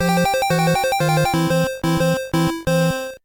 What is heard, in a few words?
Pinball Game Over, Game Over music